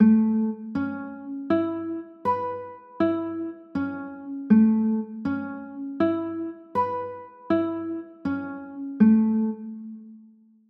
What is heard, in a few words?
a add9, chords